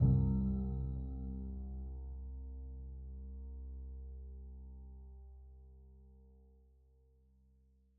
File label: Concert; AngelicVibes; Waves